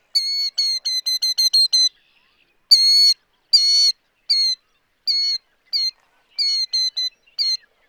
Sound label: killdeer